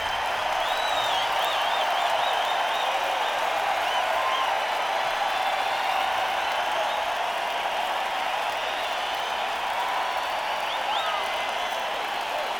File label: Encore Break